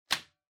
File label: Vacuum Cleaner Switch